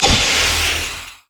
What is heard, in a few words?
smoke grenade; LoL; graves